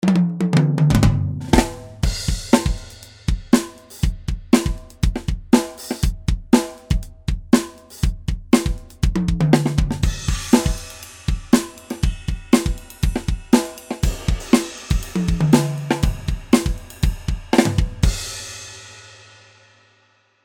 rythm fx; drumod

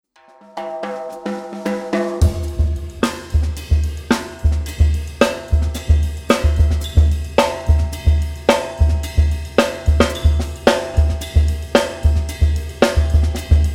rhythmic session baio

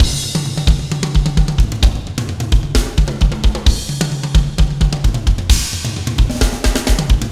4 bar bridge 131 bpm

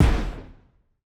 stomp; nova sound

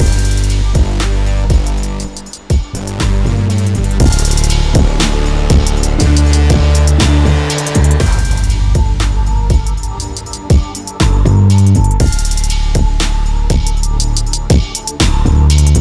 beat mixbus ab

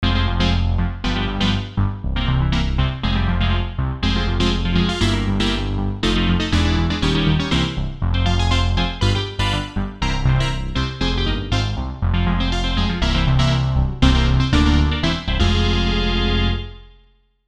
ragtime; polym vicemerson

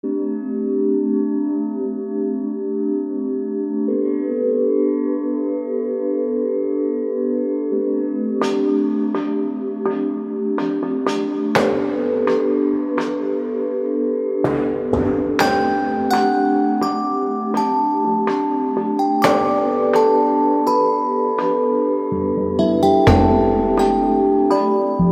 resonance meditation

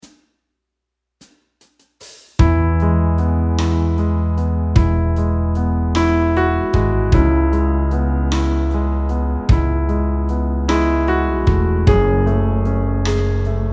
ballads instrumental

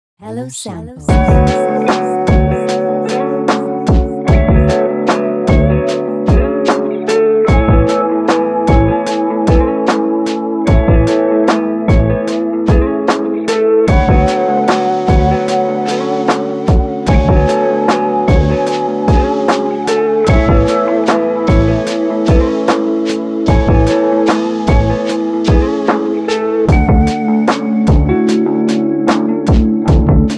lo-fi birdy beats

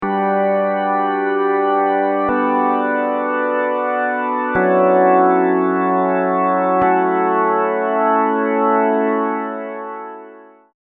serum chords; chiqui audio

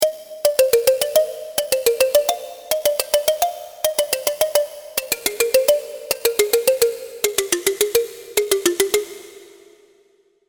serum plucks; chiqui audio

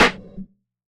snare

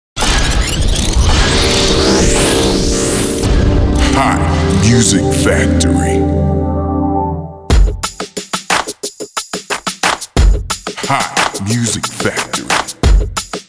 gospel clicks; gospel clicks pro 4

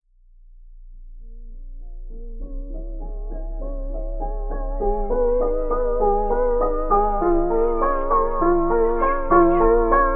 pastoral piano; samplescience

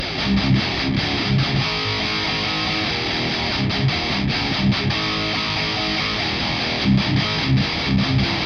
sharper cab; rebel

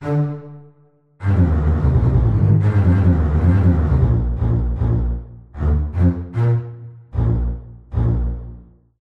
contrabass spiccato

